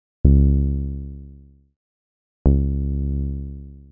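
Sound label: 122 BPM Bass Synth A major F minor Tonic C; Pro Bass Synth Studio Kit; MMB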